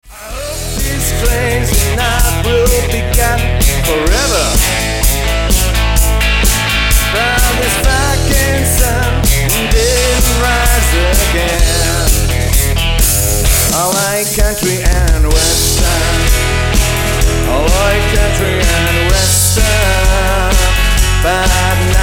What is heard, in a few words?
country and western